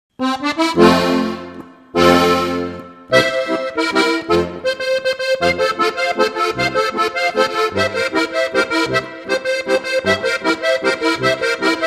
landler

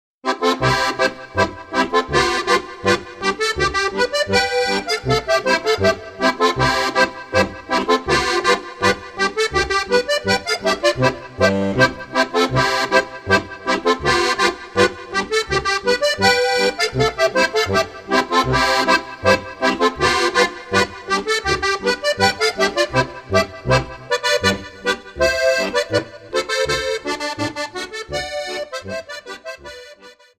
boarischer; Leicht und Zünftig - Folge 4

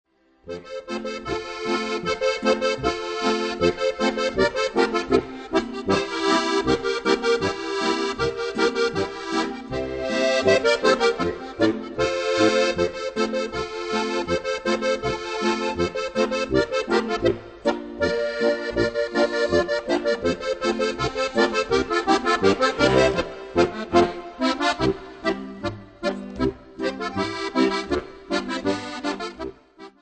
marsch gestrichen; Meine liabst'n Stückl'n

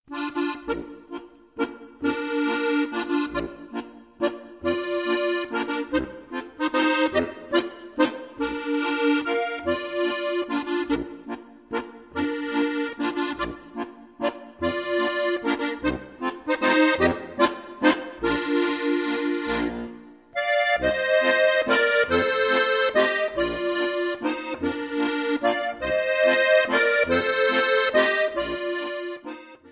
walzer

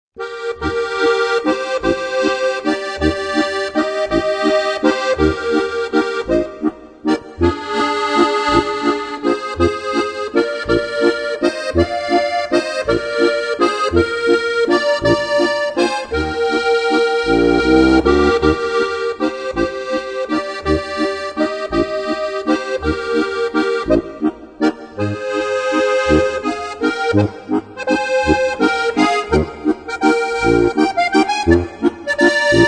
walzer

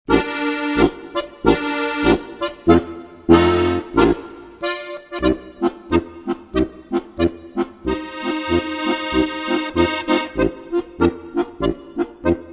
marsch; Leicht & Guat - 15 leichte Märsche

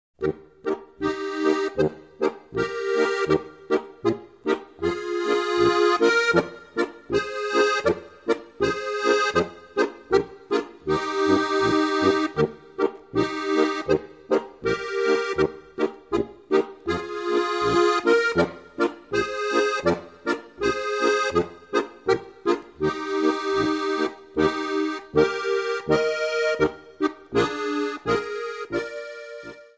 polka; 30 Alpenländische Volkslieder und Weisen 1

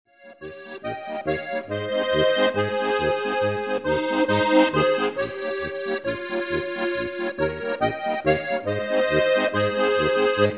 polka

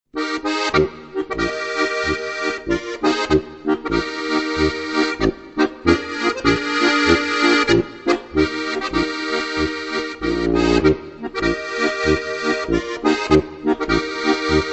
marsch; Leicht & Guat - 15 leichte Märsche

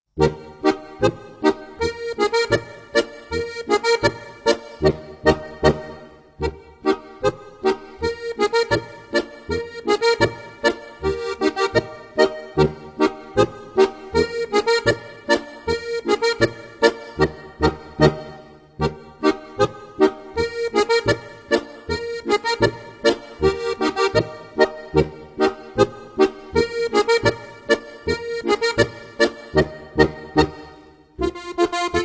polka

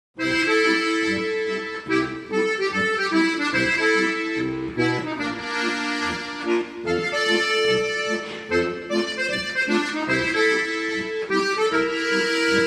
cool steirisch

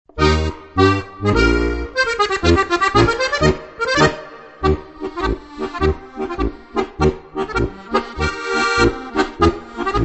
Andulka Marsch

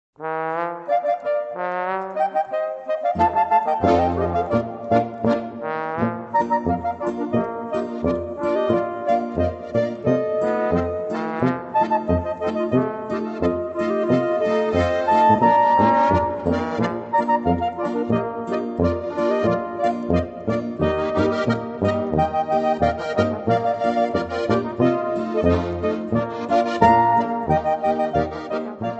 wildbacherl boarischer

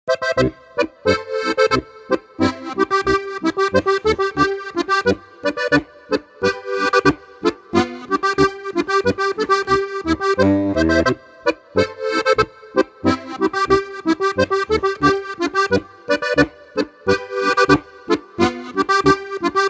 Boarischer

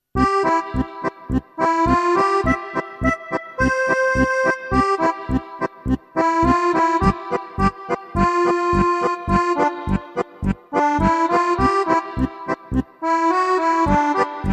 marsch

